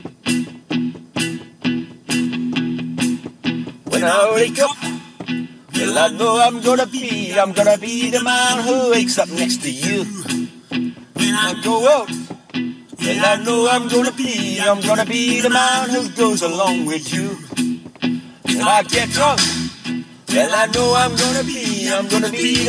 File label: bad bad singing